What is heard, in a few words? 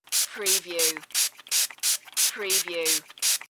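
Spray Bottle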